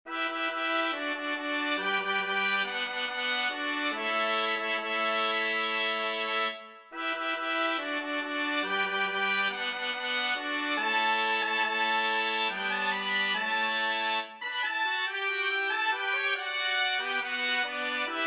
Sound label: Fanfare 5 trumpets